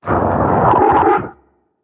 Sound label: damage; TIGERTRESHER